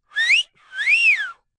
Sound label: Whistle Cat Call, Whistle, cat call (human voice)